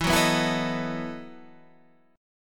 E Major 7th Suspended 2nd Suspended 4th, Guitar, Half Step Down